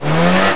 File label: accel